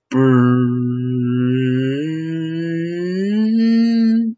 Music Musical instrument Brass instrument Trumpet, vocal imitation